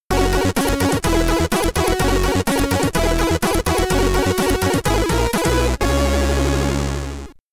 Get Ready! (SID Stereo), commodore 64; Parsec

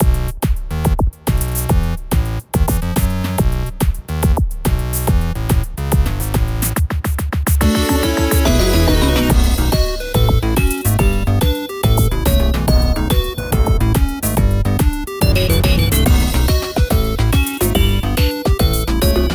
Jingle Dress; Taiko no Tatsujin V Version; PS Vita

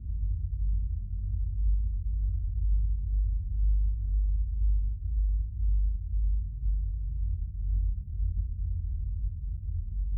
Intro Sequence Low Ambience; The Town

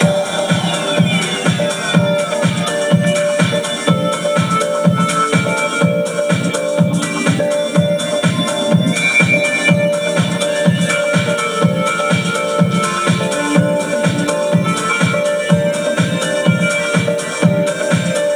bgm pub; yakuza ryu ga gotoku kiwami 2 pc gamerip

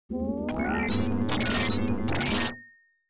Machine

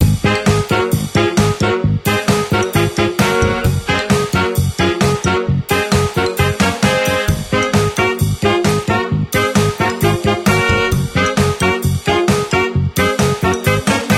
Battle

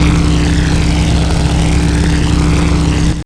Engine Tun Gear

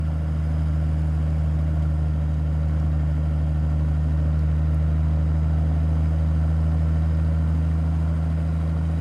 Car Moving Normal

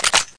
Whoosh

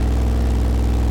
Mercedes-Benz Slr Mclaren Low

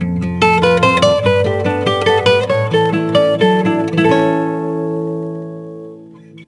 Jingle Good; tamagotchi connection corner shop 2 ds

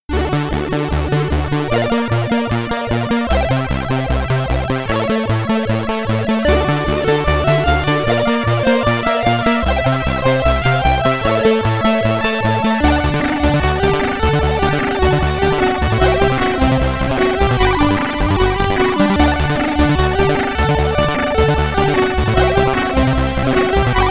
SID Stereo, commodore 64; Las Vegas Casino; Black Jack

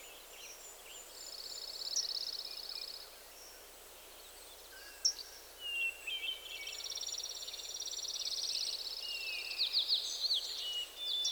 Relaxing Sounds; Picross S3

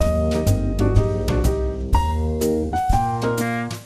serving music

